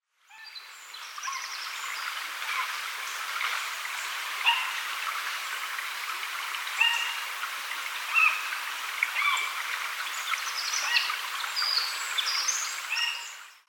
Rehe am Bach, Wasser; die quelle des lebens wasser

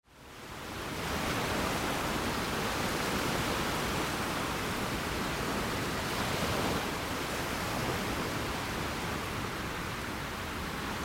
Hoerbild Meer; Tinnitus; tinnitus erfolgreich beeinflussen

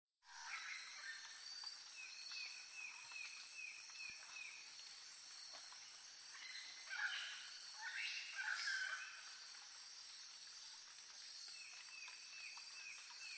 Ein Affe ueber dem Boot, Amazonas; rio bariuau seitenflusschen

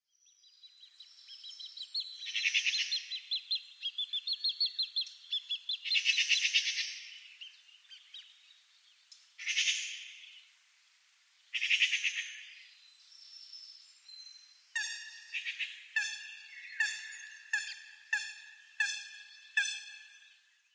Vogelstimmen am Wasser

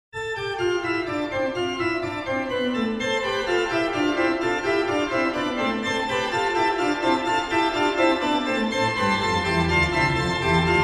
Concerto a Moll; Bosco

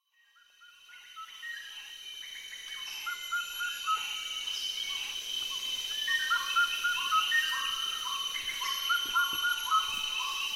Vogelrufe aus Terengganu; abenteuer regenwald sudostasien

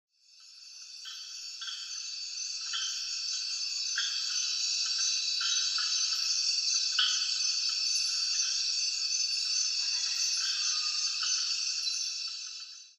Mitternachtsstimmen, Amazonas; terra firme; Amazonas 3

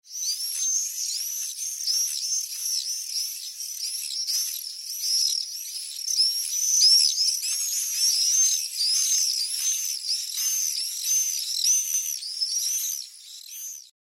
Mauersegler ansiedeln; mauersegler gierzwaluw swift martinet noir

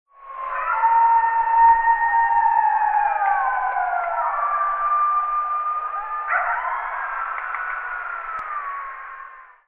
Kojote; Woelfe Schakale Hunde; les voix des loups chacals et chiens

